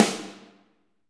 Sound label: SD, Real Kit; 90 s Sample CDs; Sound & Vision - Gigapack I CD 1 (Roland); Real-Kit m15